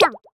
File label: quick; HeavenStudioPlus; catch Of The Day